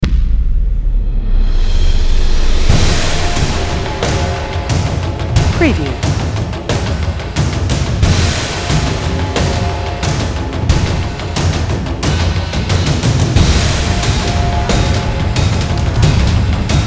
Cinematic Action Drums Mechanical Rhythmic Trailer